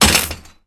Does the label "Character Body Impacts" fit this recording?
Yes